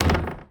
Street Ball Glass Back

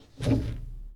Foosball Blocker Spin

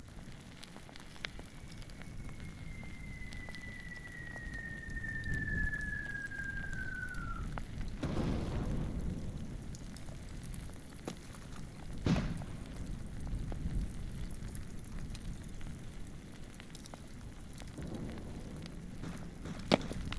AMB; Curigos; BLIND QUEST - The Enchanted Castle

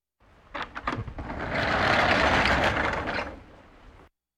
Animal effects snorts grunts farm ambience

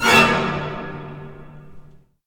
zombie stinger; DeadFright

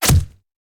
Bullet Impact; Sound Ideas; Guns

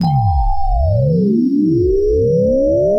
Scifi Power Up; SFX Kit; Energy & Forcefields

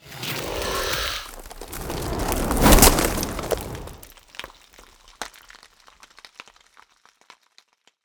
Ice Spell Whoosh Frozen Cyclone, Cold Whooshes; Arctic Aura

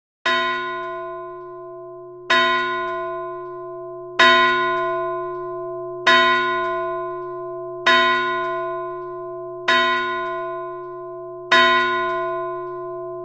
church bell ring; DeadFright

